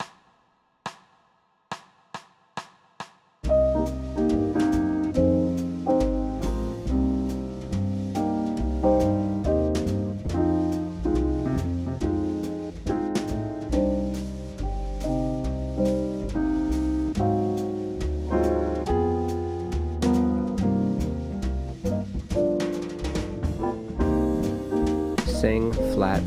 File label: C Minor Blues Straight Alto; applied jazz ear training; Practice Perfect